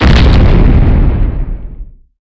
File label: Explosion